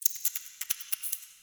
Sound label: Interior Clicky Critter; Ambience; Indoor; Warframe; Infested Eris